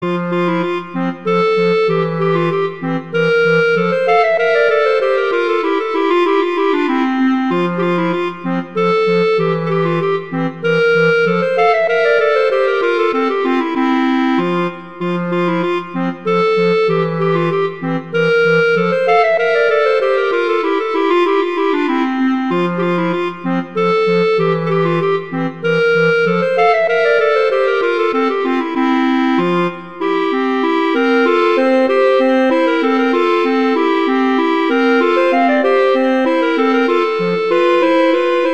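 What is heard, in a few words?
Duet, horn; Mozart Duets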